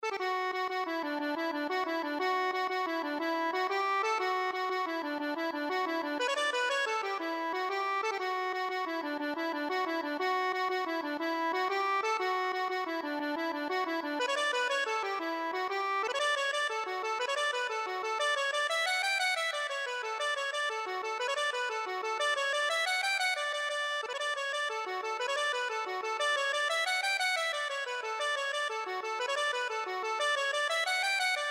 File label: jackson frieze coat, accordion